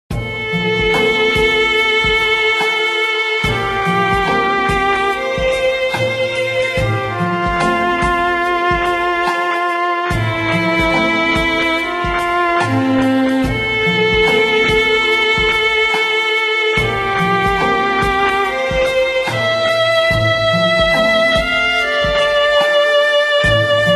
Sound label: Chill Hop Music; menherafflesia flowering abyss; android